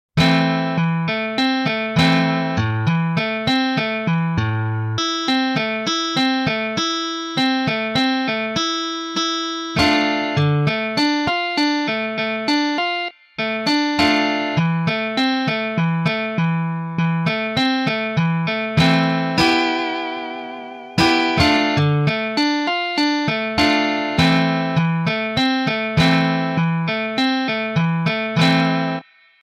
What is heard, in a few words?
Arpeggio Solo; solo techniken